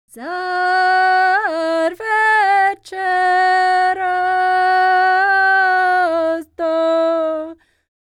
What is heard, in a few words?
SERBIA, Vocal World Voices SERBIAN